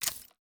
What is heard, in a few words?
fruit disappear; fallguys